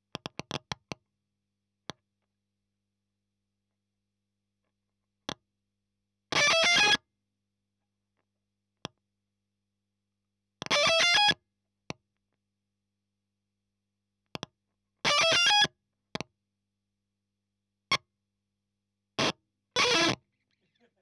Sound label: EL Fuzz End